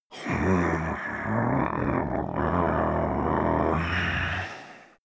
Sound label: male growl sleep; menschen; koerpergeraeusche; soundpool